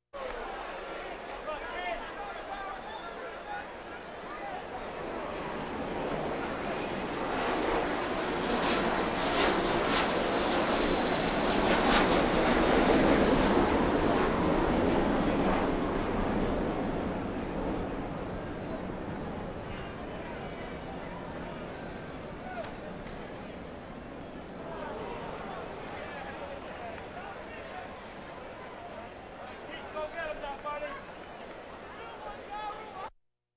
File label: Crowds Race cars Baseball